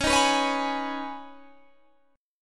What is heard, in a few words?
C sharp 7th Suspended 2nd C sharp down Guitar Standard; C#7sus2; strummed; JGuitar